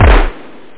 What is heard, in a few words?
tb bassdrum r8 hand